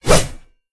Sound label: Swing; smite; god susano